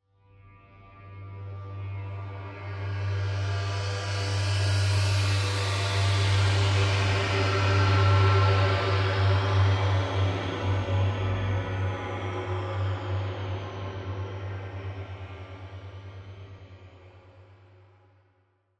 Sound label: Horror Swell Airy Crescendo Synth; Scary Tones; Blastwave